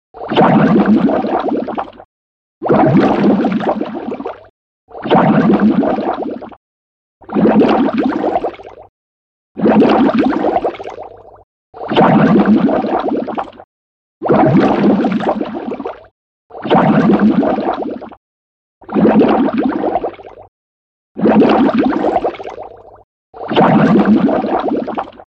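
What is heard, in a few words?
Bubbles Long Rhythmic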